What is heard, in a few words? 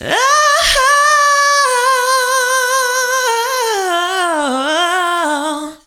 ad lib ahh, female vocals; PlatinumPhattCD2; E-MU Producer Series Vol. 8 – Platinum Phatt